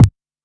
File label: Quasimidi 309, Drums